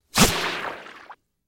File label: Bullets Slice Water